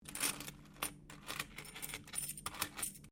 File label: Coin Penny Multiple Move Laminate; Common coins; Money; Objects